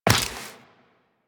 Step Flesh, Footsteps, Walk; Warframe; Tenno

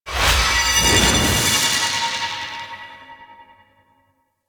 locked chest unlock beam; Ambience; Props; Warframe; Duviri

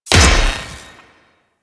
Spear Throw Impact B, Weapons; Warframe; Corpus; Spear Gun

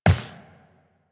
footsteps walk; Warframe; Tenno

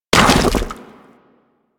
Mech Step Surface Goo; Step Surfaces; Nechro

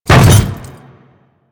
Hammer Boss Step Default, Footsteps

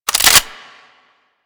Lever Action Rifle Reload, Weapons; Warframe; Tenno

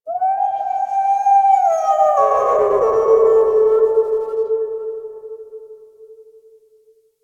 Night Distant Coyote; Warframe; Ambience; Eidolon Plains; One Offs

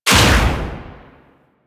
Artillery Barrage Shot; Weapons; Powers; Warframe; Archwing

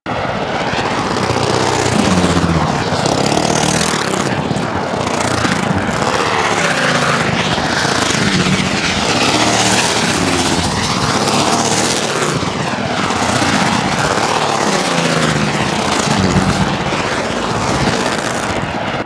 Skiff Atmos Loop; Grineer; Vehicles

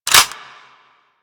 Weapon Jam Slide B; Grineer

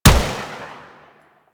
Shot; Warframe; Void Trader; Gorgon

